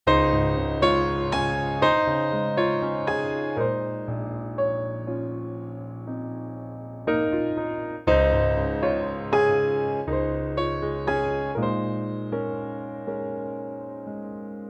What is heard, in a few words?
Db piano; key - Db - vocal range - A to Eb (optional E); Very lovely piano only arrangement; Pianotrak